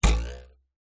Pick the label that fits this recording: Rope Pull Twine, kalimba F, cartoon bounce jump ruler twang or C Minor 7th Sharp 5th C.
cartoon bounce jump ruler twang